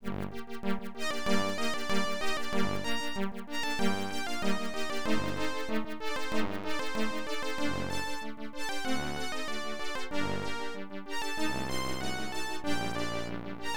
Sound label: Molto Allegro, music synthesizer card; AdLib; IBM PC XT AT; Wolfgang Amadeus Mozart, Symphony No. 40 in G minor, I